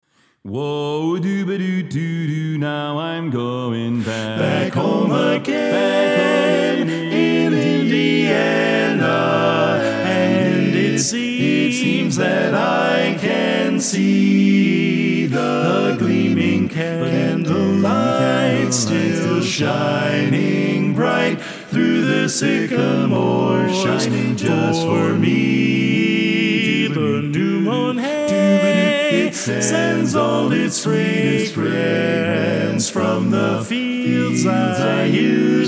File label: Bari Left